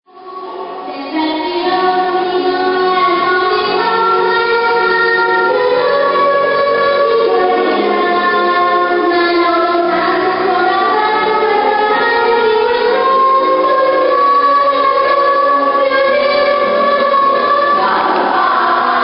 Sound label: choir